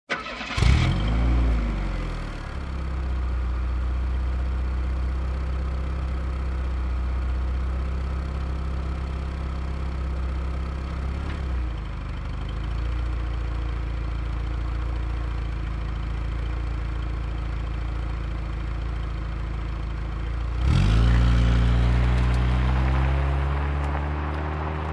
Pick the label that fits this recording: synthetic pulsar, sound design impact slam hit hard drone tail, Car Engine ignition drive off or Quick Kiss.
Car Engine ignition drive off